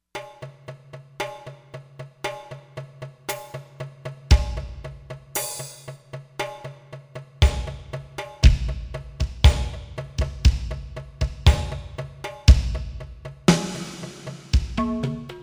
Per & Drum

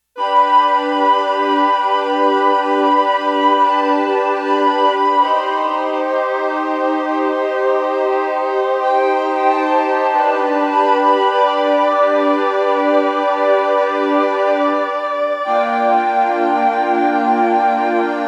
Roland JX-1 Woody B; Factory presets; Additional factory patches

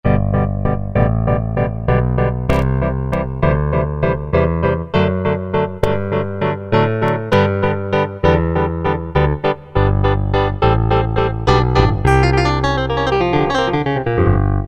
Bass Piano, Roland Alpha Juno-2; Factory B

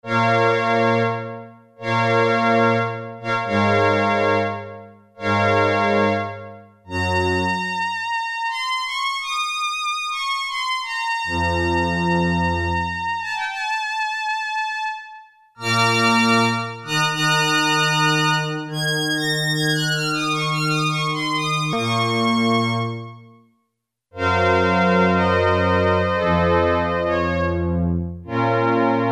STRINGS 3, Yamaha DX7

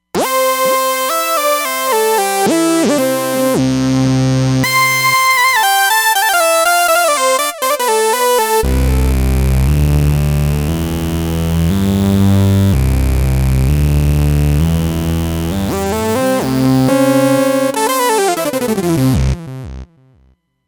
Actual Analog, Roland Fantom XR

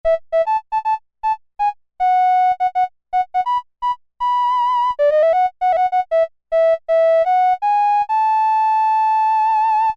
flute, Korg M-500 micro-preset

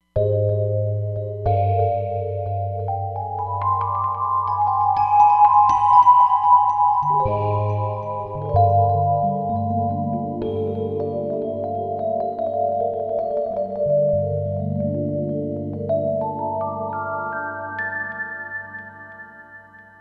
Spirit Tines, Roland Fantom XR

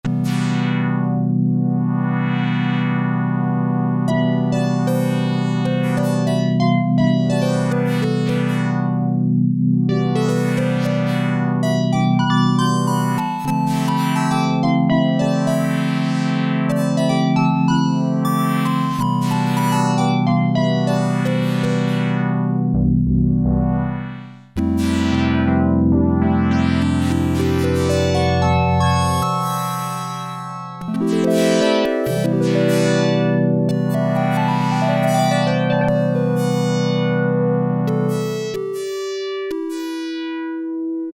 PULSE WIDTH MOD, Oberheim OB-8; Factory Patches

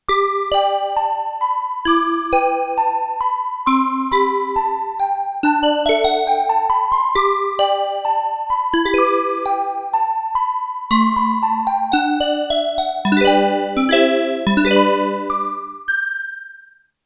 TOY PIANO, Korg DW-8000; Factory Patches

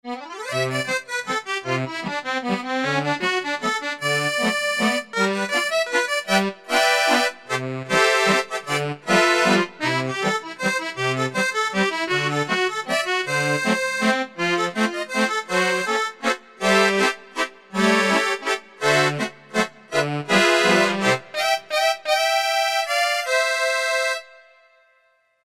org, E-mu Proteus FX; Accordia

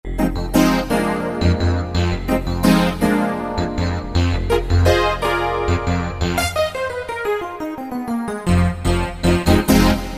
Attack-Reso Synth; Roland PN-D50-04

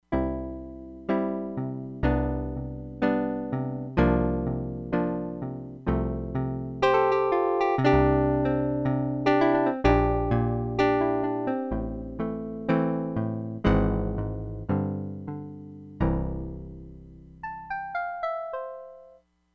PIANO, Crumar Bit 99; Factory Programs

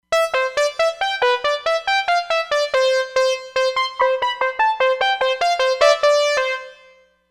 FS Saw Ld 2, Roland Fantom XR

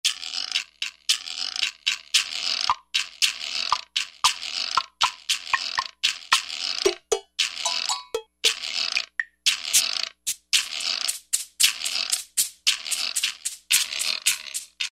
Latin Perc., E-mu Proteus-1; Presets

